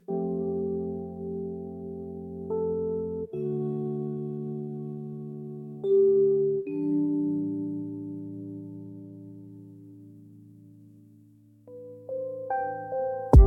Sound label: focus lofi